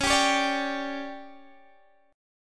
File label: D Major 7th Suspended 4th, chord